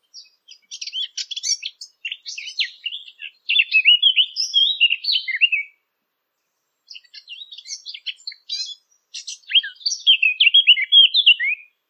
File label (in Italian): CAPINERA